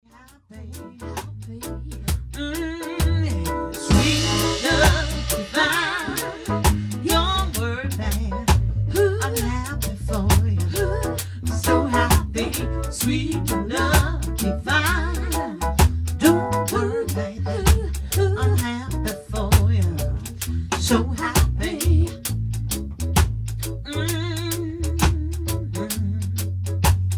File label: ballad, reggae